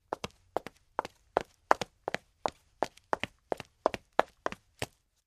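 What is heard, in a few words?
Footsteps Female Run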